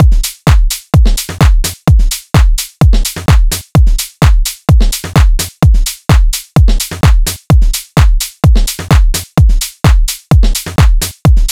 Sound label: Drum Loop 128 BPM, House; Dogs